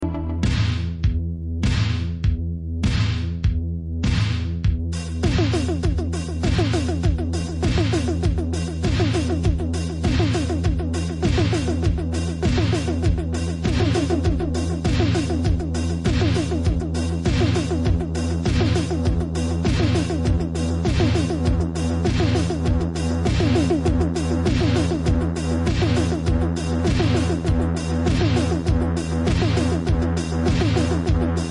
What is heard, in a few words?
Instrumental; Disco